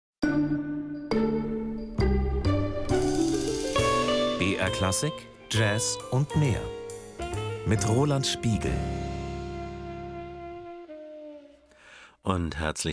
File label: Jazz; Jazz und mehr; br-klassik